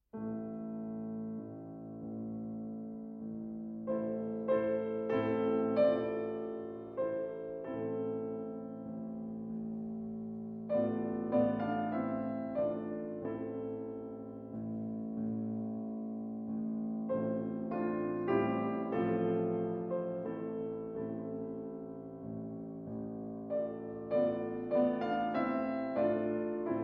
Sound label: Russian Folk Songs; Tchaikovsky 50 Russian Folk Songs, TH 176 - 23. A Little Duckling